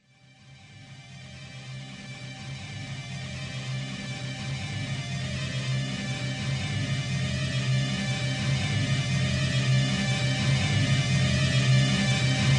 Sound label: noise; viselica; reznya